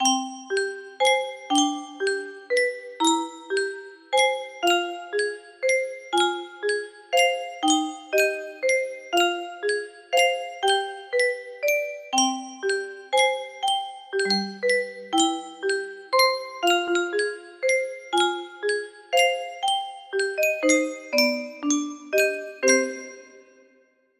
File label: melody